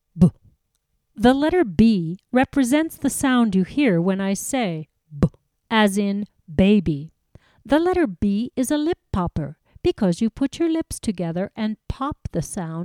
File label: Triangle